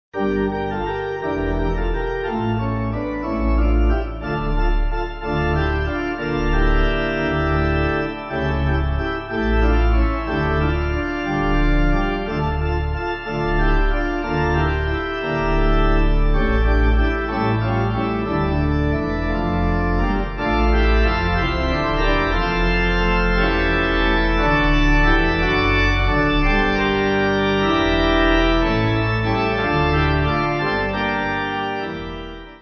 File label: Pipe; Come Let Us Praise; Chilean; 4/Dm